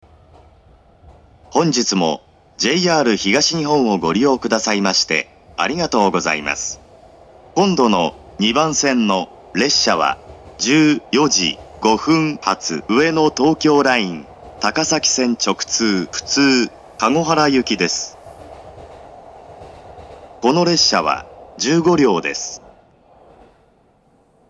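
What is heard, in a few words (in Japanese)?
新橋駅 旧発車メロディー; 東海道線（上野東京ライン）; 東京・上野・宇都宮・高崎・取手方面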